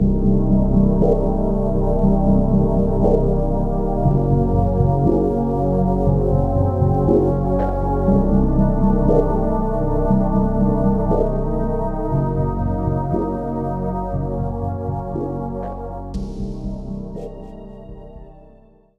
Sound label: BGM Amb District